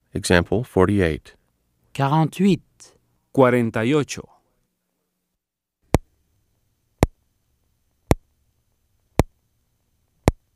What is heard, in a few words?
Keyboard